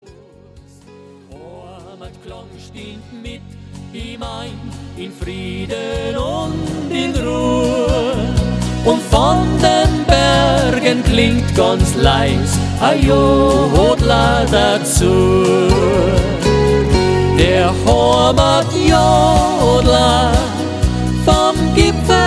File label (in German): Jodler